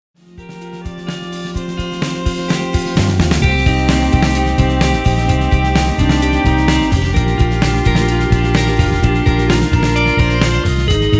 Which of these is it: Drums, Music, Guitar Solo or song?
Drums